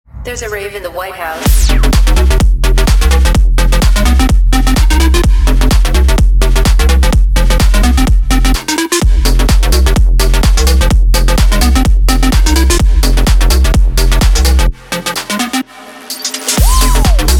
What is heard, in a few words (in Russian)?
Отбивка